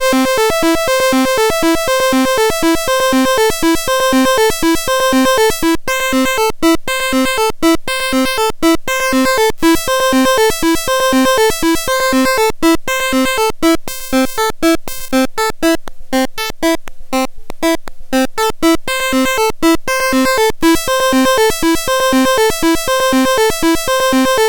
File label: Moogerfooger Synth Simple Fb Lead; Amazona Moogerfooger Workshop